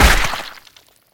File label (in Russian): lazernij luch porazil zhivuyu cel